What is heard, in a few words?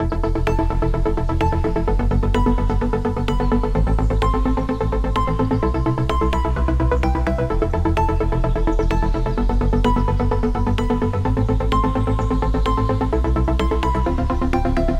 128 BPM A Min, House Breakdown Loops; Cymatics; Infinity